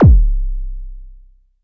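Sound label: mbrute kick, Arturia MiniBrute; DRUM MACHINES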